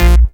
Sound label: Synth Shots; Vengeance Samples